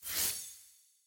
ui glass flare

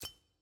sfx uikit player object button press

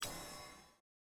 summon hover; exalted hub; x1

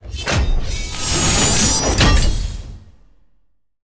ui ranked wood; jade

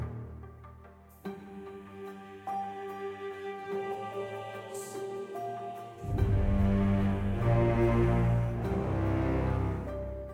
combat defend mountain loop; opal

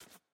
ui map panel back

